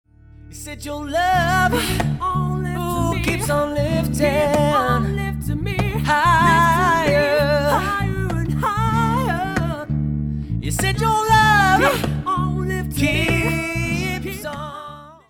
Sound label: Acoustic Duo LIVE